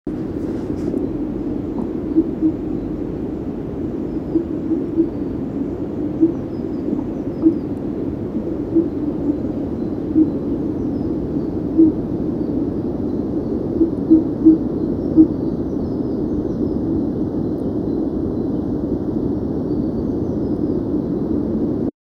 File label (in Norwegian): dyrelyd; Spør en ornitolog